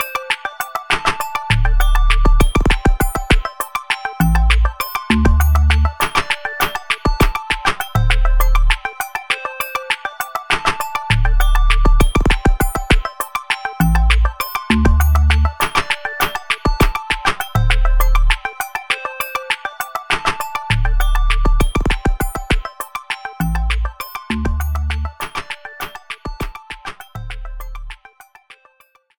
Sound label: Bonus; Blocks That Matter; Xbox 360